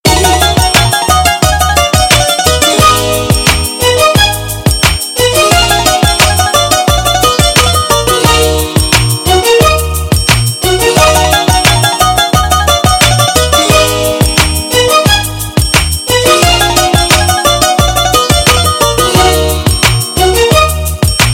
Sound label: greek tone